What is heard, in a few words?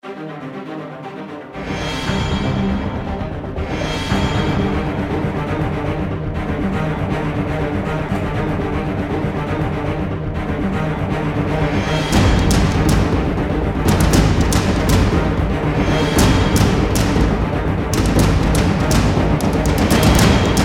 action